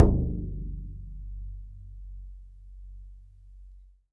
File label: BODHRAN, Ireland; E-MU Producer Series Vol. 7 – Old World Instruments